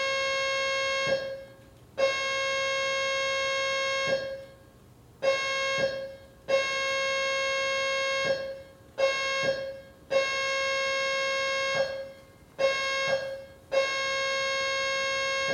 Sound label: Fire Evacuation Long Short Tone